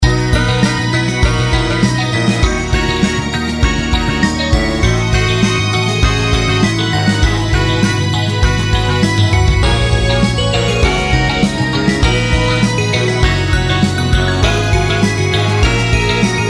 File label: shopping xmas